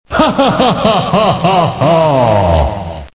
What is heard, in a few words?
ha; strider returns